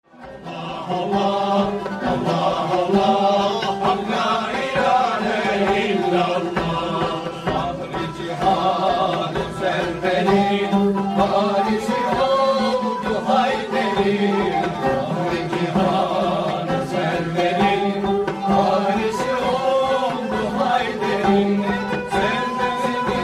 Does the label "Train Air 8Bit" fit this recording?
No